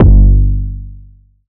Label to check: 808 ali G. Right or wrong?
Right